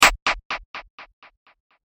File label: Click With Delay